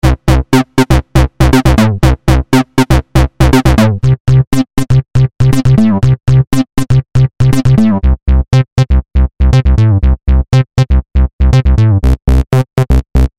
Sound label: Synths